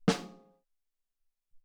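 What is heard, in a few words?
Ring Snare 2, Alesis SR-18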